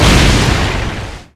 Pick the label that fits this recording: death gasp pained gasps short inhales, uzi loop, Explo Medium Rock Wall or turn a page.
Explo Medium Rock Wall